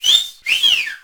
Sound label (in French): sifflet; Scenery; Decors